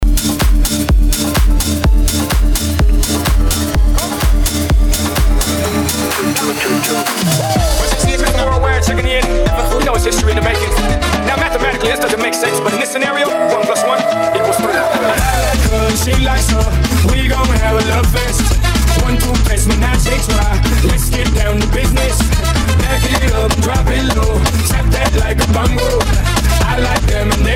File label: Transition; Redrum; Transition Pack